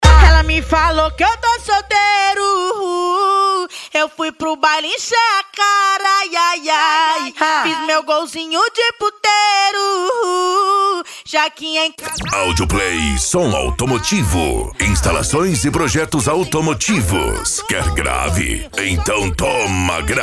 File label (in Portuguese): esp funk mega bass